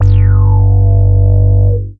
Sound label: G2, BASS; Q Up Arts; Dream Experience; BASS BANK 6